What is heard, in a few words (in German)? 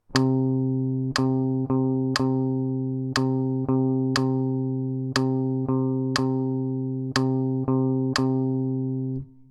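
Rhythmus Viertel und 2 Achtel mit Metronom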